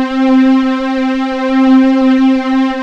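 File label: MKS80 STRINGS C5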